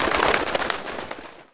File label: bats